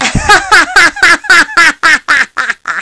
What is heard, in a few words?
gahaha